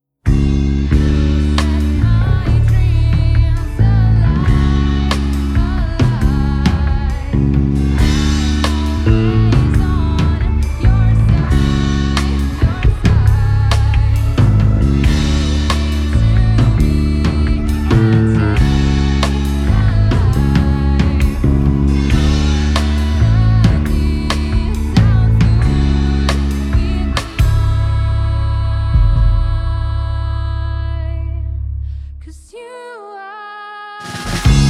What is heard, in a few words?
DRUMS BASS